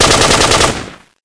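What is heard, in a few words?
assault rifle burst; Falldemo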